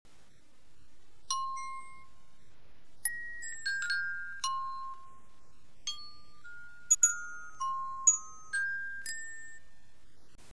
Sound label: piano melody